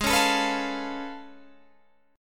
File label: A Augmented Major 9th G sharp